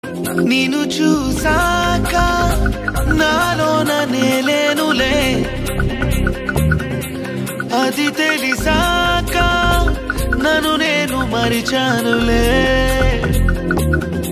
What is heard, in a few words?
Telugu Song; Varun Doctor